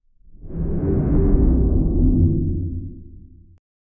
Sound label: suspenful buildup